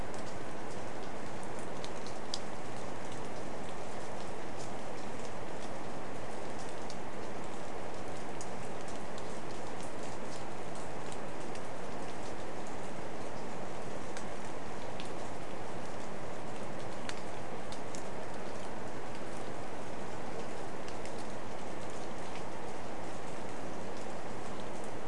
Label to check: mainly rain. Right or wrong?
Right